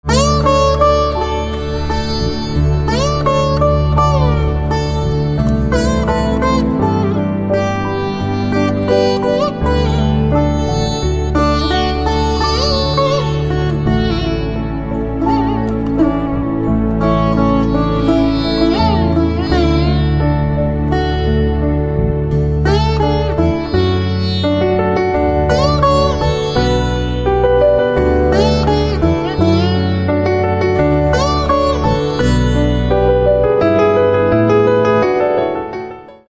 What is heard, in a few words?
Veena, instrumental ringtones